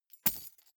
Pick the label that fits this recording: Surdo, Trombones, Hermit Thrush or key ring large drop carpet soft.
key ring large drop carpet soft